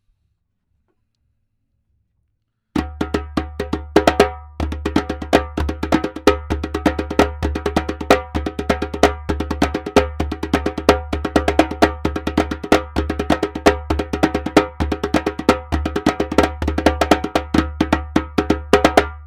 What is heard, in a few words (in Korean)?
쿠쿠 리듬 젬베